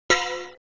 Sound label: door off